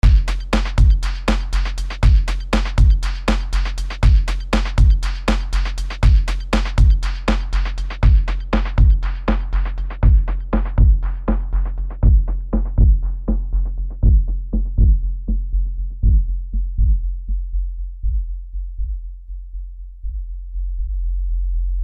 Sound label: Pulse2 Audio In LP24